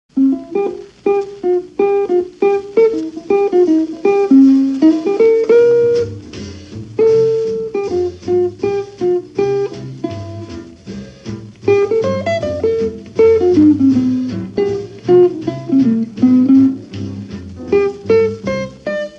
ad lib blues

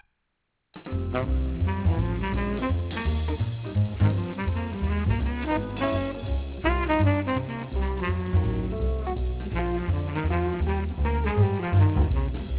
piano

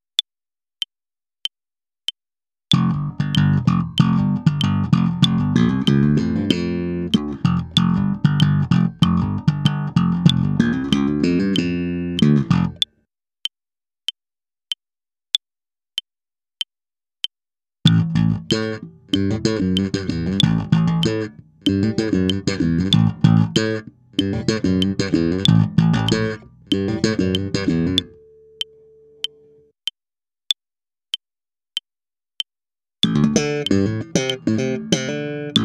Slap, bassoelettrico

